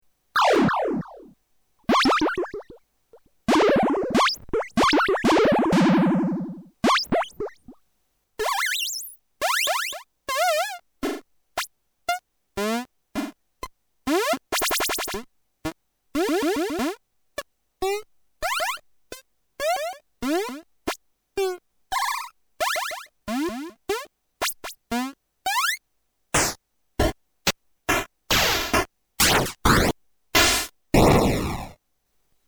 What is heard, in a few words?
sfx